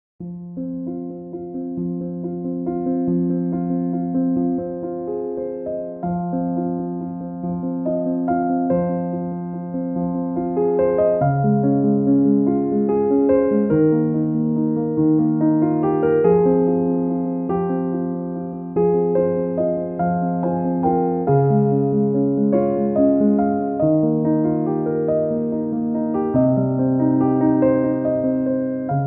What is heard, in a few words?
Clean Piano; piano orchestral